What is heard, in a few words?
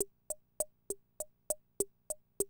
CR78, DrumLoops